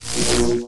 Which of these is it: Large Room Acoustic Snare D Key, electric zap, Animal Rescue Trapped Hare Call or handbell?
electric zap